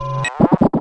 dog phrase; Half Life 2; Episode 1

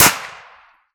glock; weapons; glock18

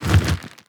subtle, zombie blind, foley; creatures

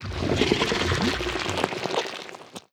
idle subtle, barnacle; Half Life Alyx

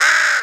squawk pain, crow; critters

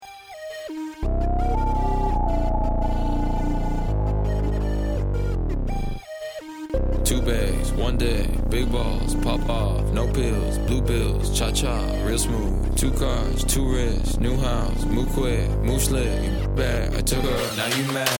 start round, music

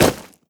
step run, footsteps player debris

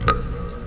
drip, ambient weather; Counter Strike Model Pack; CS:GO

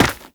step run, footsteps, gravel

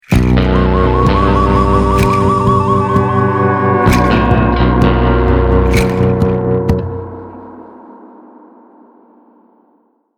lost round, music

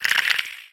relax, clams; infestation

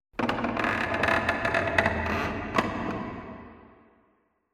wood creak low; Half Life Alyx

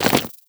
walk, antlion

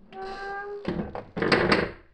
house creak; ambient; inferno

